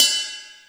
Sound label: PING RIDE; Big Fish Audio; Synth City